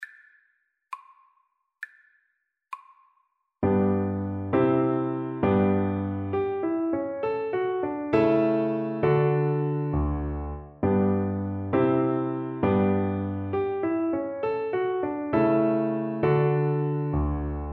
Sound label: Gigue G Major violin